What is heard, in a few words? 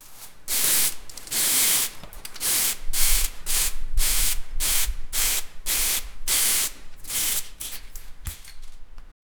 cleaning garbage using br, cleaning